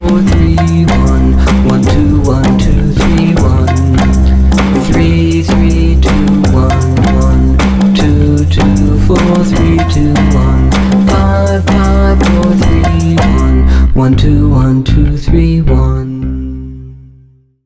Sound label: Aunt Rhody Melody with Numbers, Children Ear Training